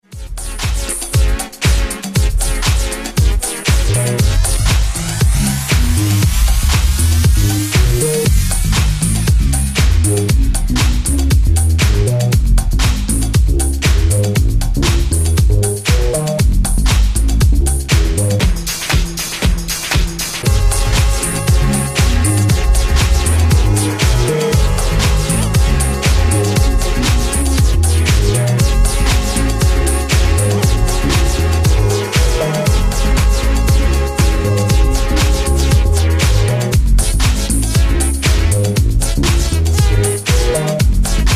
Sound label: bajo bajo